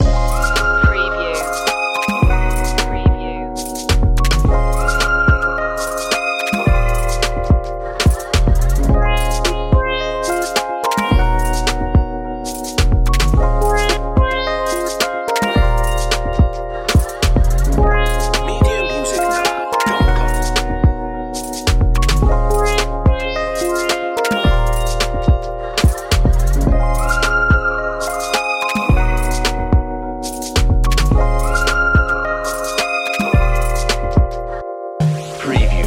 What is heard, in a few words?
hip hop main loop